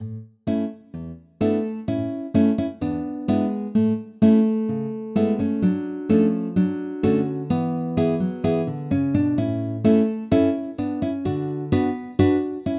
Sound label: Gavotte